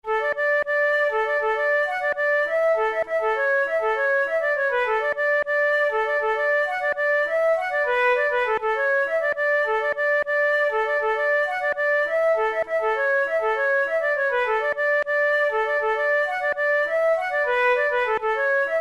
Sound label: jazz, flute